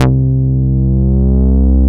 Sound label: Synth Bass, Roland